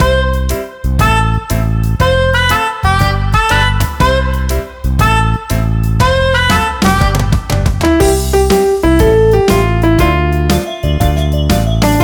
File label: karaoke